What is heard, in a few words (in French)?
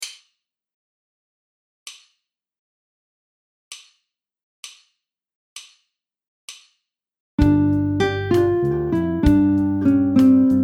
riff